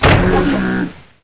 Boat; Jonah A VeggieTales Game

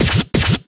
Fill 176BPM

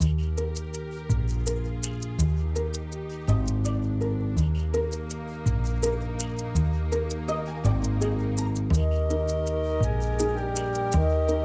Musicloop; Pokémon Masters Arena

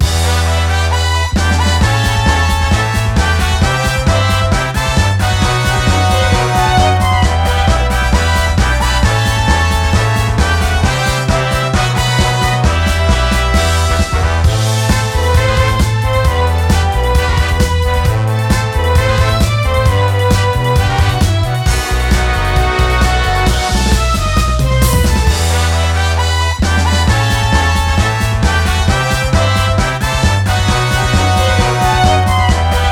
Music event; Power Pro Kun Pocket R

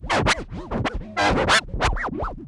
Mystery Box Loop Scratch; Subway Surfers City; Android, iOS

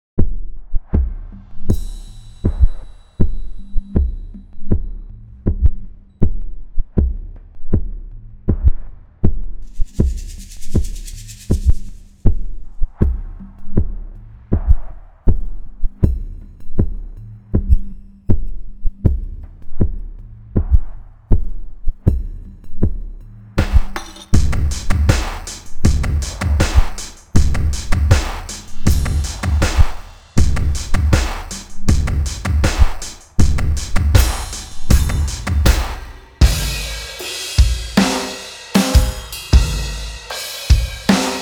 DRUMS

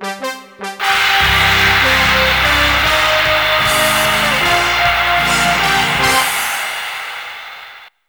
Goal; super sidekicks 2 the world championship; neo geo cd neo geo

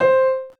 PIANO

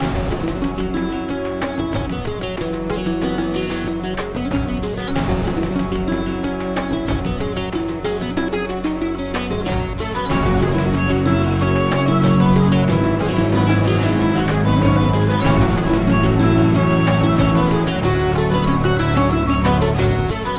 Combat; The Hobbit; PS2

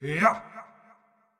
Wind Up Short; Marv

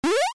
1up

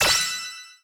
Continue; Leave; Tap

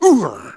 Kill Jumps; Picnicboat

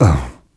Oh, Na; Podium

